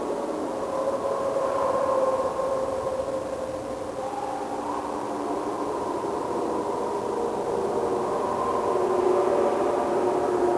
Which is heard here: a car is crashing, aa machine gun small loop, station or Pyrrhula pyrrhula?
station